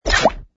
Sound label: Pop Out; conservation catapult; project green